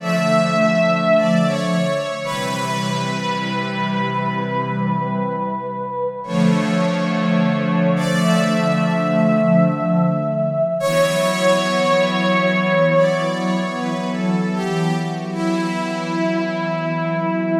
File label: clavier